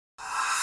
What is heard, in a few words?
Abstract Transition